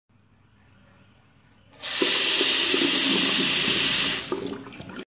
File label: faucet; شیر آب